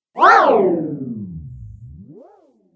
Jump And Fall Whoosh; Whoosh Sound Effects

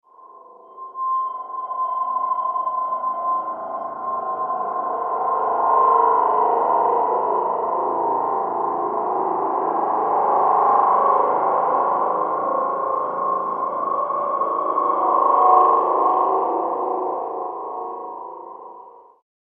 Horror Frequency Fear; Evil Sound Effects

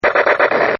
Toy Gunner; Toy Guns

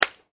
SLAP SINGLE, hand sounds; Sound Effects:Combat

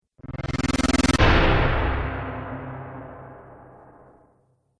Blockbuster Movie Impact; Miscellaneous Impact Sound Effects

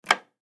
Hotel Room Light Switch Flip; Household; Blastwave; Foley

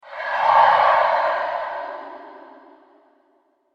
Phantom Monster Of The Night; horror sound effect

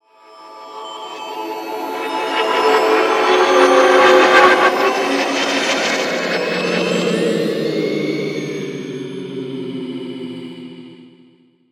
Sweeper Swell, Science Fiction; Scifi; 5.1; Sound Effects:Science Fiction; BlastWave

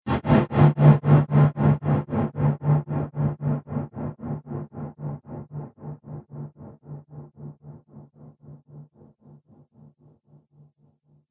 Drum Hit Trailer; sound design

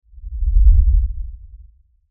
LFE Tremor, Lfe Tremor Low Bass Shake Rumble; Blastwave

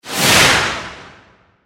Space, Whoosh, Airy, Science Fiction, 5.1

